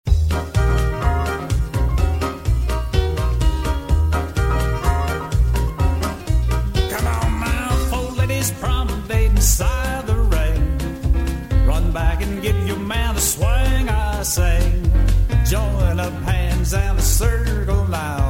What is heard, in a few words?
vocal